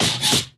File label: taunt bunnyhopper hop; video games; source engine; tf